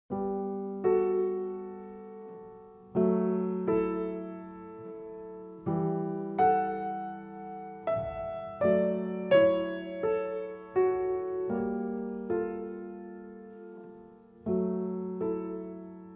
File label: twinkle, piano solos